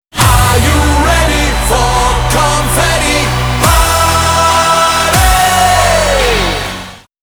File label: Refrain DJ Tool Jingle; Confetti